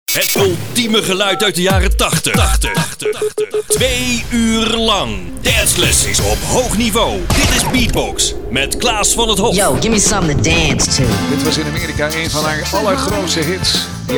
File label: beatbox